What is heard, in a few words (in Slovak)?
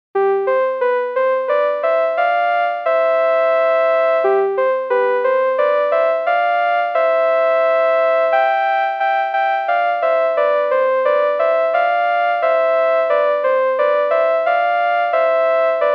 zbojnicke tance